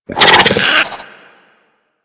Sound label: Infested Biped Alert, Vocals; Warframe; Moa